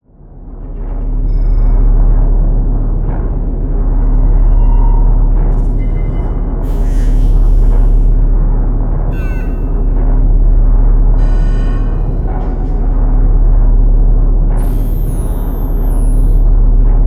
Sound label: background command center ambience; Bluezone Corporation